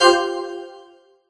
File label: Music Frontend